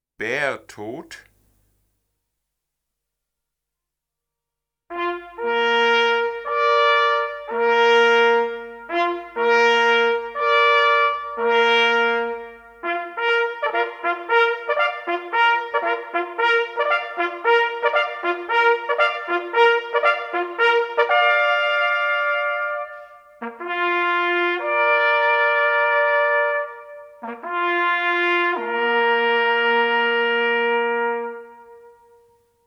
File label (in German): Bär Tot, jachthoornblazers